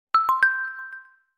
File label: huawei harmonyos